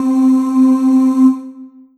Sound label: Voice Oohs C4; Alesis Fusion